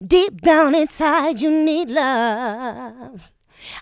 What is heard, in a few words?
voc, female vox, Vocal House